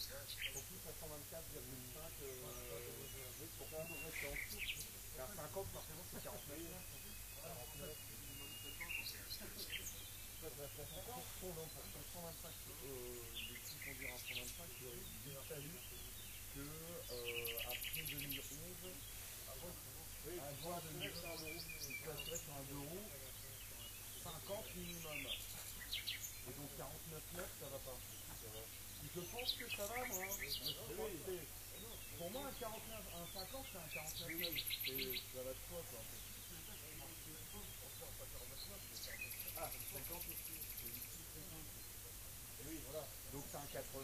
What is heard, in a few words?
rayol farm; SEAMED